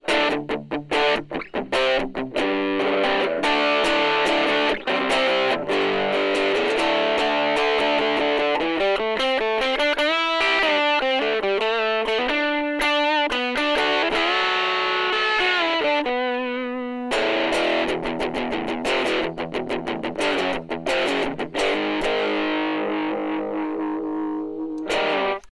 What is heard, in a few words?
distortion; fluid audio strum buddy